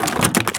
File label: Weapon Drop; Warframe; Grineer